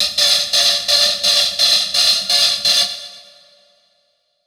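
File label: alarm siren, reverb processed, 170bpm; musicradar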